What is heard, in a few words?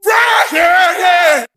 "rah huh yeah" SUNG; ooh ahh